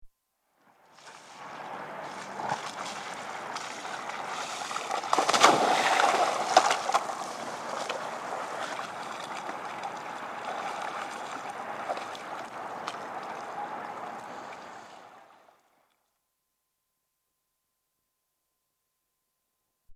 Windsurfing, board capsizes & mast hits water (Recorded on nearby boat); BBC